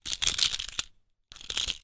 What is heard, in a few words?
toy plastic handcuffs handling